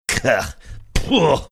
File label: making disgust sounds